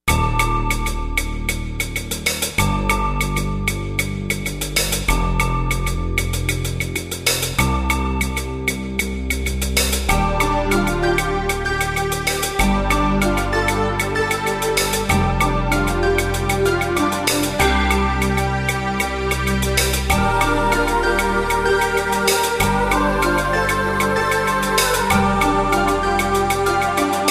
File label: Spring Winds